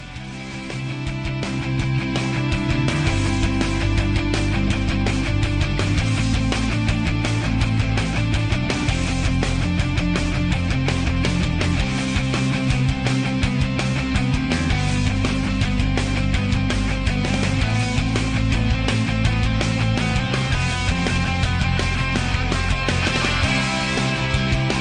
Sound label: Rock